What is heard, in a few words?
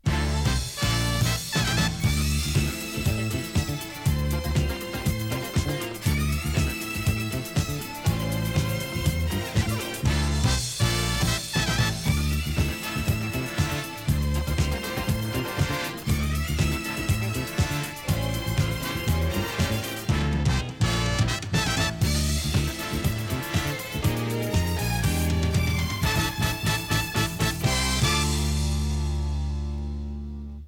topformat maxi jingle single